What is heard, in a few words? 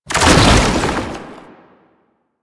Babau Run Start; Enemies; Warframe; Proto Infested